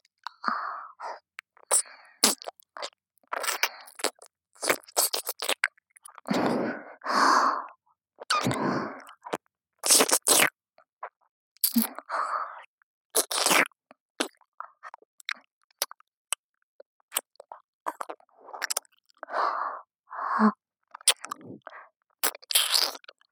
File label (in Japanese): 左耳舐め (ループ); 穹; SEあり